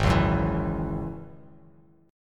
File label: G sharp 7th Suspended 4th Sharp 5th G sharp, Bass Standard; G#7sus4#5 strummed; 4,4,4,9; JGuitar